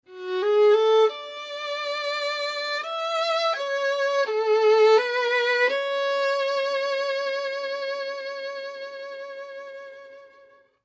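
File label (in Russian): skripka, violin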